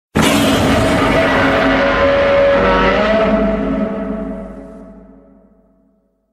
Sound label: godzilla roar